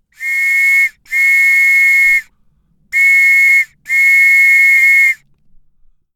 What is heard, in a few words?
police whistle